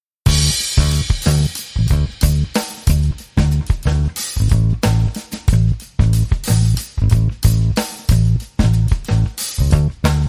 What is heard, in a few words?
Blues, DnB